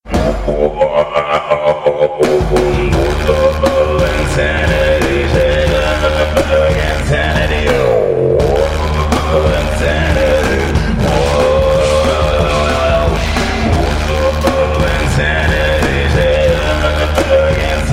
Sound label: Ringtone